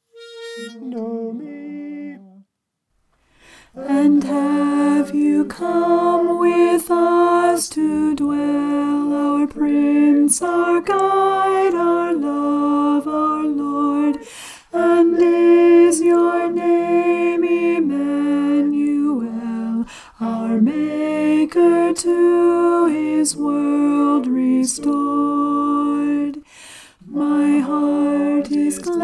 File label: and have you come alto